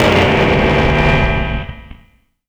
EFX Basement Metal; Polyend; Plughugger

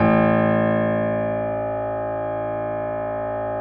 A 0, Keyboards Acoustic, GRANDP; AKAI; USB Soundscan vol.17

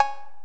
Korg x5, Drums

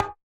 909 kit conga

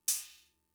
Cymbal, Fricke MFB-522, Drum Machines

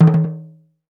NIGERIAN, African Perc; E-MU Formula 4000 Series Vol. 4; Earth Tones

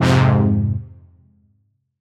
Fm, Chords; DoobaDubba; M8; Electronisounds

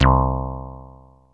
Bass Synths hits and octaves

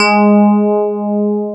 PIA DX E-PIANOS

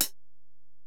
HiHat, Alesis DM-PRO

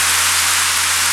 RAIN; East West - Global Sounds

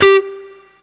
BLU PICK, Guitar & Bass

